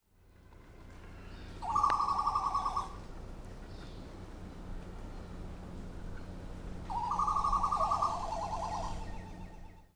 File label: fregata minor, aves; isla del coco; punta presidio; historia natural